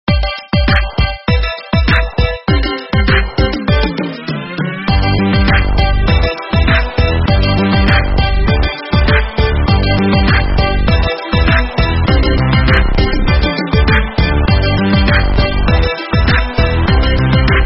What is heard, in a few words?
Bells; Sony Ericsson; W950